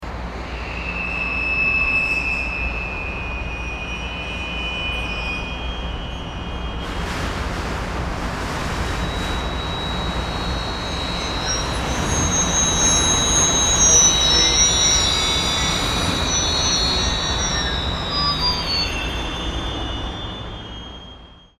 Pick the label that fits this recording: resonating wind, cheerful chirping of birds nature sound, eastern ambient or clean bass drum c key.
resonating wind